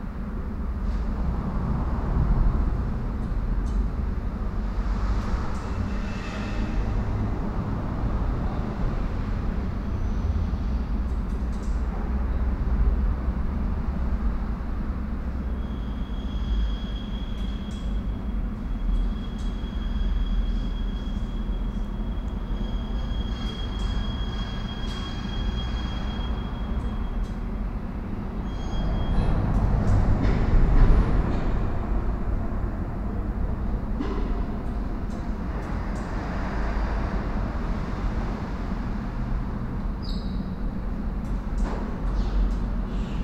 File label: Urban night echoes; urban and suburban; boom library